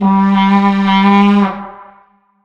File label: Vuvezela, Noise Maker; Gong